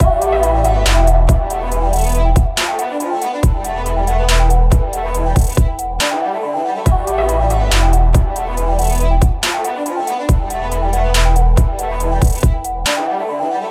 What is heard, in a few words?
tension